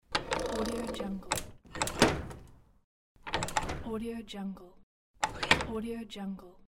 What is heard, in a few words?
safe unlocked